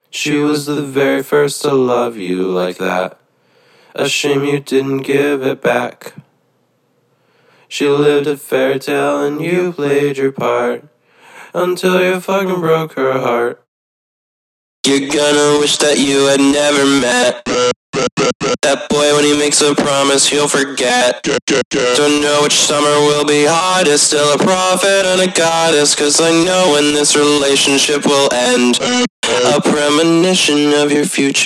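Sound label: 127 B minor VOCALS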